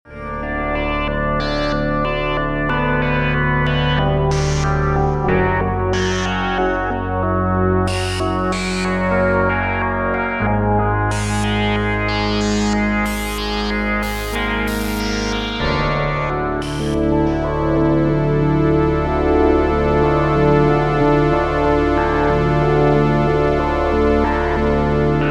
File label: OBX OBXA